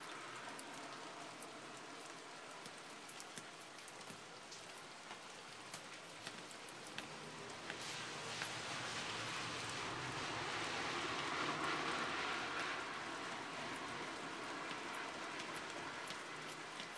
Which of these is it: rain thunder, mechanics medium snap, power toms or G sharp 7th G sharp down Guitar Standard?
rain thunder